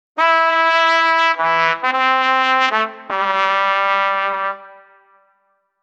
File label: distant trumpet play sad